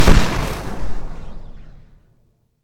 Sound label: C4 Plastic Explosive Detonation; explosion blast